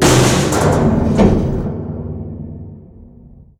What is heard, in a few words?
door stop